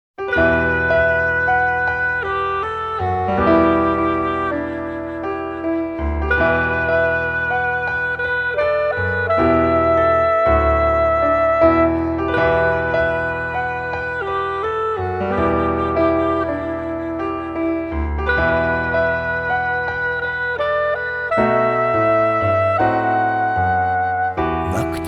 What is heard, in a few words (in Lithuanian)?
piano versija; Thundertale